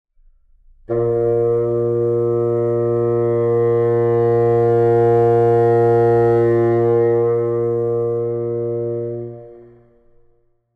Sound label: Bassoon technical dynamics